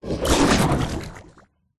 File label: cartoon slime big growling slimy bubbling